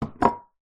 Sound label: household ceramic plant pot small set down desk